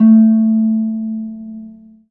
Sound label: STR HARP, STR Orchestral